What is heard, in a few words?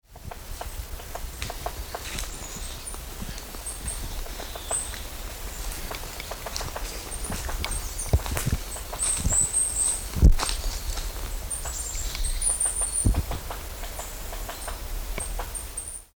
Picoides major